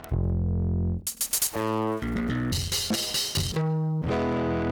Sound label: Saturator; Virtual Analog